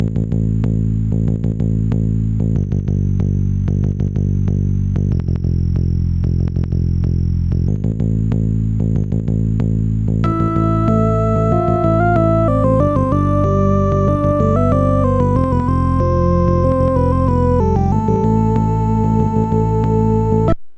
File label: asian adventure; MusicLine